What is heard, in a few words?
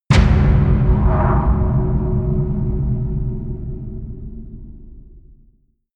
mysterious impact; whooshes-rises; hits-impacts